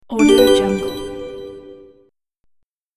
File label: game level marker notification